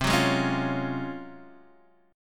G sharp 13th C

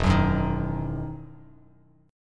E Major 7th Suspended 2nd E down Bass Standard

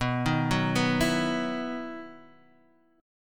E Major 7th Suspended 2nd

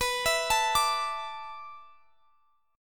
A Suspended 4th B, Asus/B chord; arpeggiated

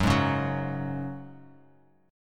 F sharp Suspended 2nd, Bass Standard; strummed; 14,11,11,11; down

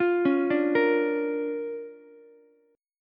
D-Minor Major 7th Sharp 5th-D-down-Ukulele; DmM7#5 arpeggiated; JGuitar

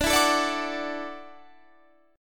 E 7th Suspended 2nd Suspended 4th, D

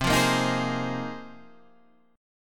Bb 7th Suspended 2nd Suspended 4th C

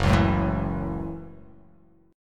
Gb Minor 7th, Gbm7 chord; strummed; JGuitar

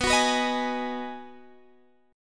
B 6th B down, chord; strummed

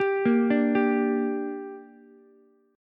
G Minor G down Ukulele, chord; arpeggiated; JGuitar

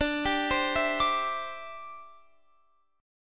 D 7th Suspended 2nd Suspended 4th, Guitar Open F; D7sus2sus4; arpeggiated; x,21,19,19,19,21

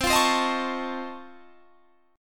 Db Augmented Major 9th, C

